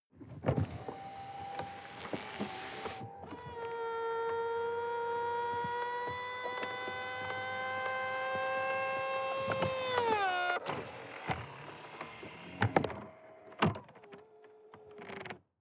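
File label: sports car exterior convertible top open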